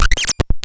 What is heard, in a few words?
Arcade Torture Rhythm; AFG Arcade Torture Rhythm; Cwejman S1; DevSnd Cwejman S1 - JK